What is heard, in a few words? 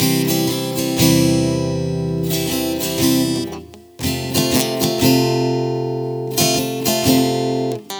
Guitar - 120 BPM (C Major), Acoustic Guitar; Heartfelt; GhostHack; Free Guitar And Piano Samples